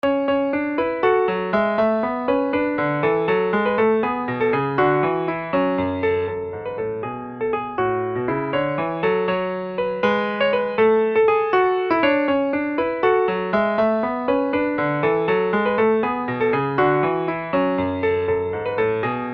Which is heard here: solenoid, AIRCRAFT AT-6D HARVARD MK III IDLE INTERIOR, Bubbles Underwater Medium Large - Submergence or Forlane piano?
Forlane piano